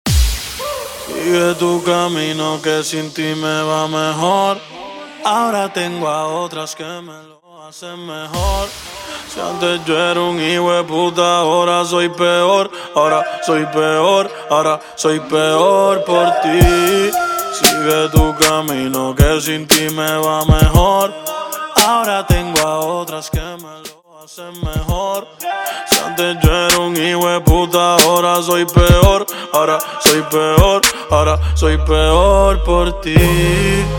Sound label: Acapella Starter 116BPM